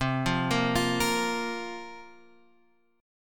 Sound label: C 7th Suspended 4th, Guitar, Half Step Down; C7sus4